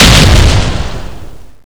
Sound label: boom; tfa cso